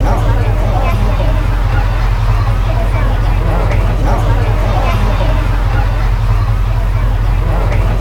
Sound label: VIP crowd